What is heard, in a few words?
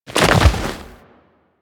Roll Forward Foley A; Enemies; Warframe; Teshin; DE